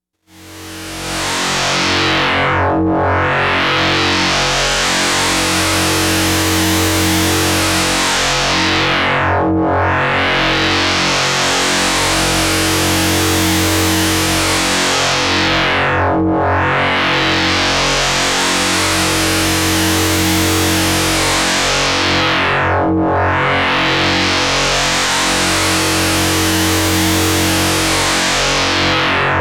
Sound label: warps cross fold; synth